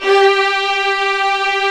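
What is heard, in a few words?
strings, Vlns 8 fff Agitato; Roland L-CD702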